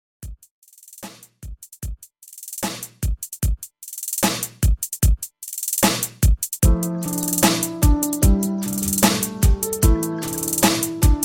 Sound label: REDRUM 75 BPM